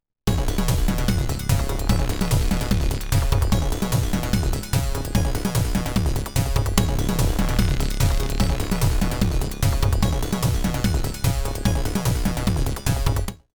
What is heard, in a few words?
OPL3; CYBSPHERE